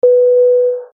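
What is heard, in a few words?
jet interior signal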